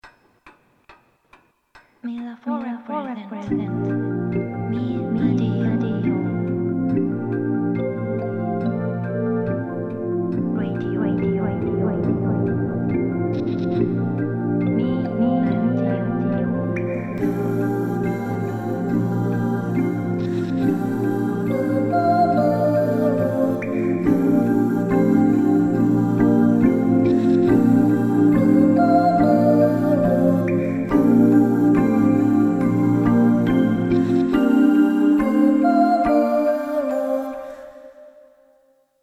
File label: jingle